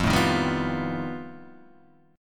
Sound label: F 9th Flat 5th F down Guitar Open G